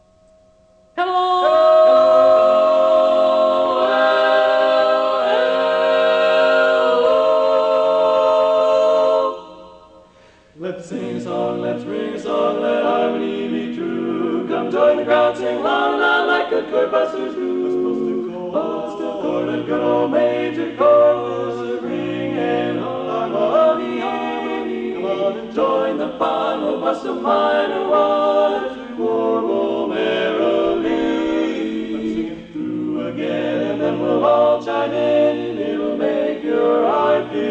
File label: March; spring show